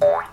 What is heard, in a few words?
boing; project hawk